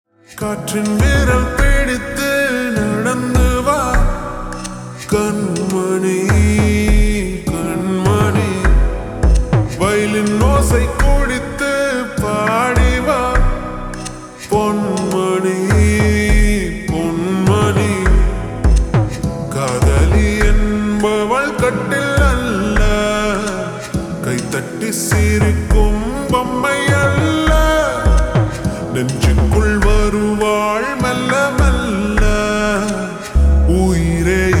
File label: Viral Ringtone Slow Reverb, tamil; aghathiyaa